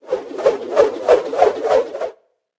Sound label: stick spin; smite; raijin